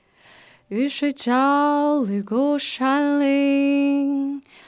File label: Alto